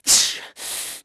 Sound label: DAMAGE; Pulse Racer